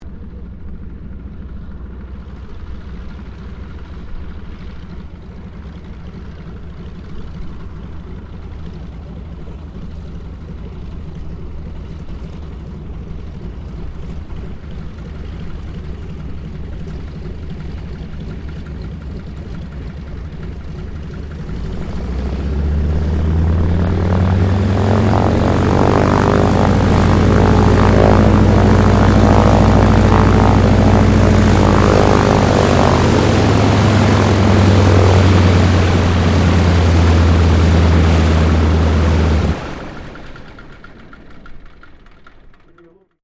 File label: Aircraft 1956 CAC CA-25 Winjeel Taxi Shutdown, WW2 era Australian training aircraft; Airplanes